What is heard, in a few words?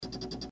fast 5 clicks